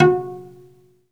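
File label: SOLO CELLO, Synclavier Strings; ILIO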